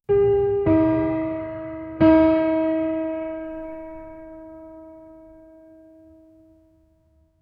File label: haunted piano playing by itself, supernatural